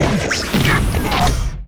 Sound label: guardtower create